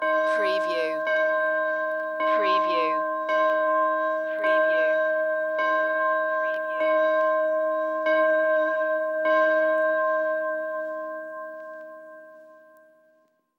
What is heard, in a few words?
Mission Bell Tolling